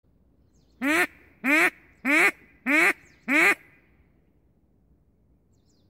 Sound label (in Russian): krik podsadnoj utki